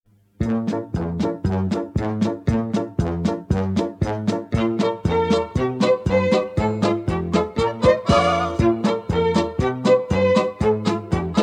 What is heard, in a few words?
Theme Music; Wizard Of Oz; Tokyo Jidougekijo